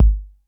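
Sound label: analog kick; 90 sSampleCDs; Crypton - DrumBase5000; ANALOG KITS1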